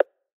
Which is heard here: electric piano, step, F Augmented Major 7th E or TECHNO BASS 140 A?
step